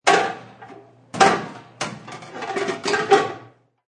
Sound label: Sounds of pots being banged around in kitchen.; Pots and Pans; Restaurants